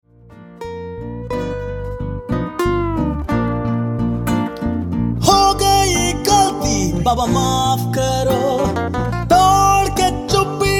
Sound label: I AM SORRY HINDI BROTHER TO SISTER MALE